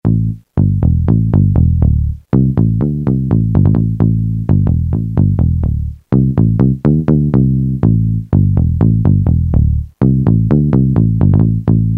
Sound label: Hood Bass; Roland M-DC1